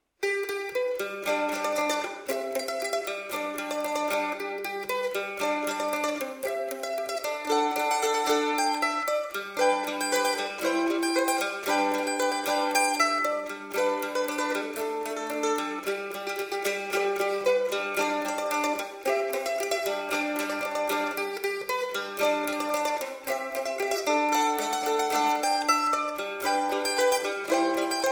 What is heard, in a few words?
pickbrush